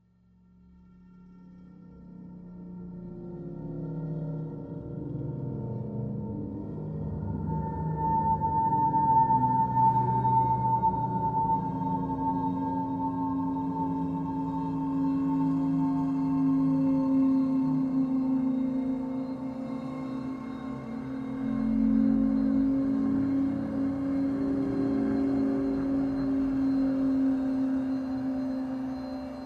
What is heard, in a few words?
soundscape